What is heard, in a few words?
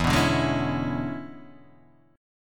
E 13th; strummed; JGuitar